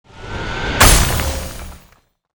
FAIL

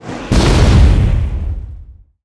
EXP Boom

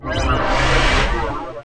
Armor Break

